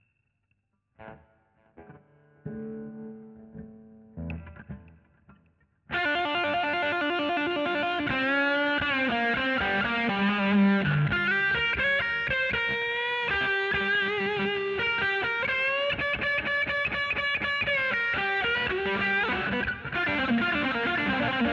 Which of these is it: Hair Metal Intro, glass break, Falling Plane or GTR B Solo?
GTR B Solo